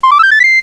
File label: Start Snd; CrissCross'em